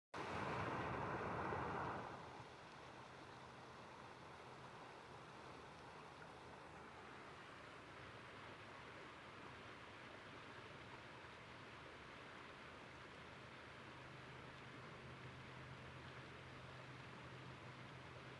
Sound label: falls sledding hill; berea